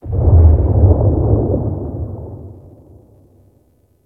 Ice Distant Ice Break Large; Ambience; Warframe; Corpus Ice Planet

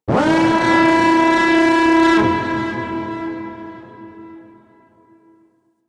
Drop Ship Spawn Alarm; Warframe; Corpus

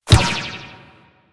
Ball Bounce Dirt; Warframe; Missions; Tenno Ball

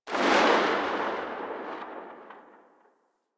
Pistol Tail C; Sybaris

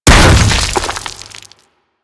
Hammer Impact Dirt C

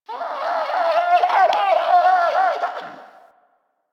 Venus Landscape Close Critter; Ambience; Warframe; Venus Open World

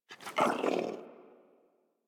Puppy Interact Sleep; Enemies; Kubrow; Warframe

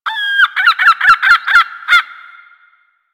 Beach Seagull; Ambience; Warframe; Grineer Beach

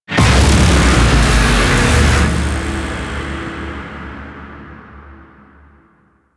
Death Beam Impact A; Warframe; Corpus Gas City; Boss Arena

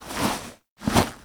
Mask Pull Foley; Teshin; Warframe; The New War; Throne Room

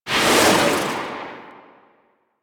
Shotgun Proj By; Weapons; DE; Warframe; Tenno; Pod Shotgun

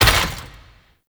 Necramech Ranged Vault Land; Enemies; Warframe

